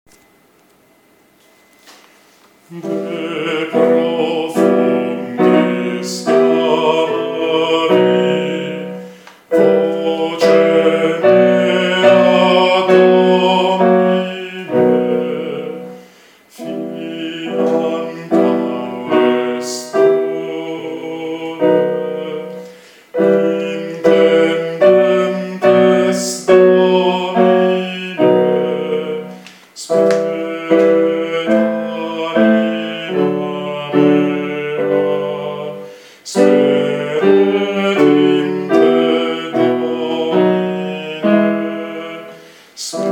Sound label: De profundis Contralti; repertorio polifonico